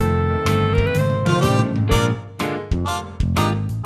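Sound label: music instructional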